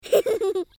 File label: giggle